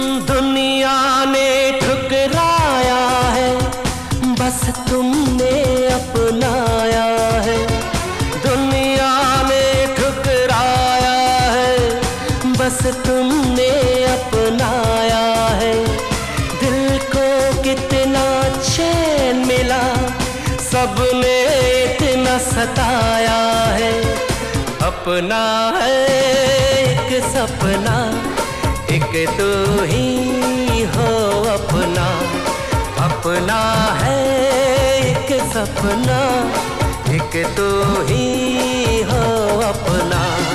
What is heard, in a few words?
Sad Ringtone